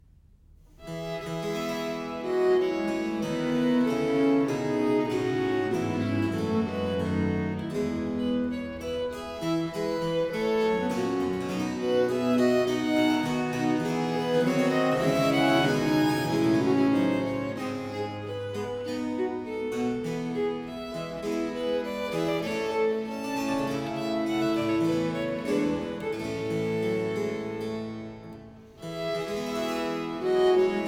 suite no. 4 in four parts, in f major, viols

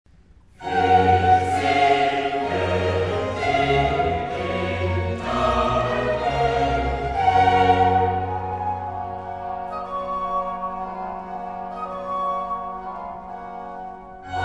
Cantata II Chorale; Bach Christmas Oratorio

